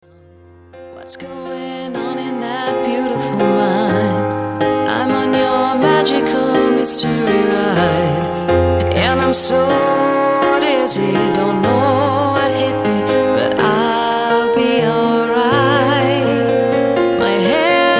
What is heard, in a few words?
Bassline